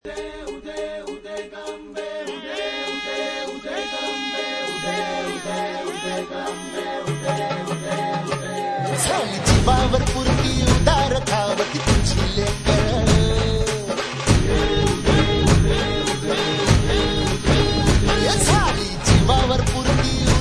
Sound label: Marathi Ringtone